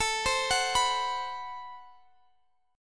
A Minor 6th Add 9th, Guitar Drop D

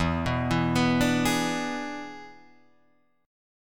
E 7th E down Guitar Drop D

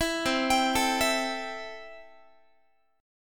C 6th, Guitar; arpeggiated; 19,20,22,19,20,x

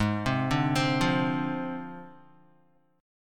Ab 6th Flat 5th down Guitar; Ab6b5 arpeggiated

